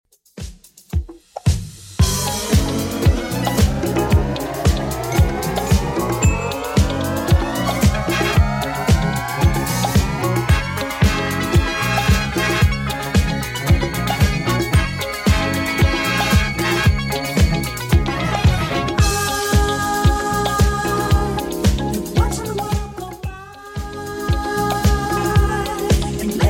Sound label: 80s Redrum 113 bpm CLEAN